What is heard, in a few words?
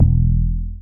BAS FM DX-7